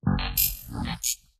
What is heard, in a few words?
code fail; Ben 10 - Omnicode